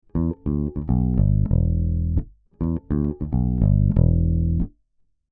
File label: DI Bass 25dB Gain; RME UFX